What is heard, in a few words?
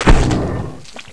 bite, bullchicken; svencoop